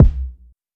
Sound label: roomy kickdrum c key